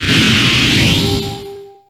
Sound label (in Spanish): Grito; Buzzwole